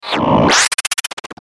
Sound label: Glitch Chopper Electric Warble; glitches; Blastwave